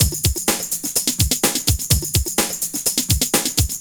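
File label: drum loops, left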